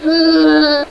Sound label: badger